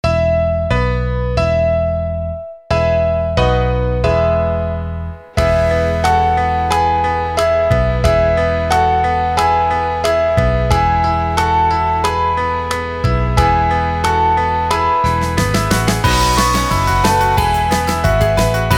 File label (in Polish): Witaj wersja instrumentalna